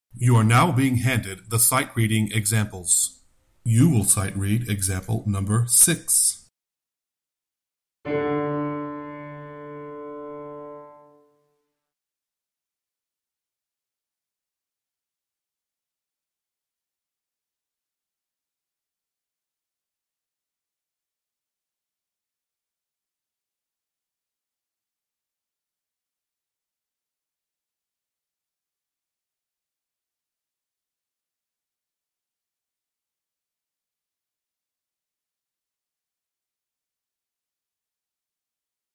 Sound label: Sightreading Example Bass